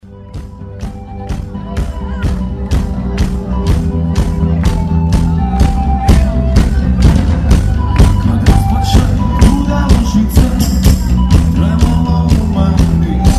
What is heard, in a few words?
TREMOLO